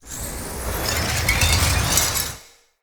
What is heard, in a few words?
Dendrit Staff Caster Charge Heavy; Weapons; Staves